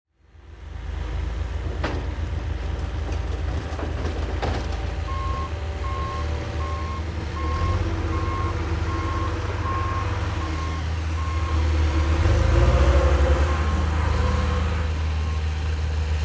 Crane Activity, Industry, Construction, Crane, Activity, Bucket, Dig, Dirt, Ambience